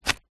foley cigarette packet open

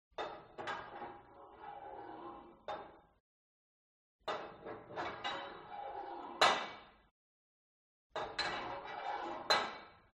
SPORT GYM Put Light Weight Onto Barbell Soft

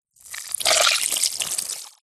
fantasy water spell magic

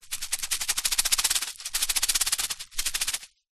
silica gel packet small shake; zapsplat; foley

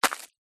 footstep single ground farm hay dirt; foley; zapsplat

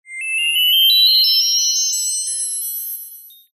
fantasy magic twinkle burst

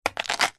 foley metal key chain small set down card surface; zapsplat

